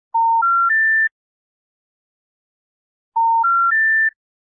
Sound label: special information tones